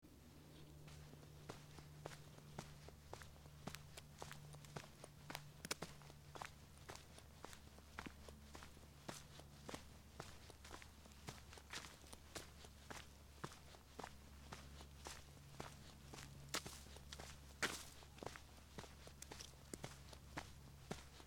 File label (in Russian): shagi po tropinke